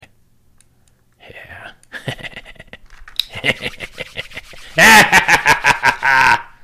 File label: greedy laugh